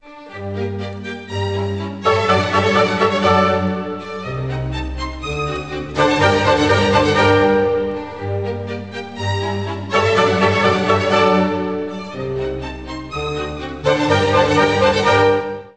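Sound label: orch clas, orchestra; Musical Instruments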